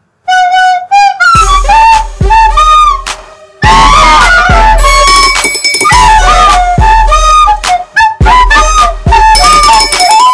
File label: yeah 3x flute